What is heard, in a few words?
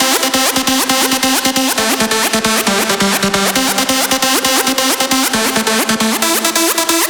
Note, lead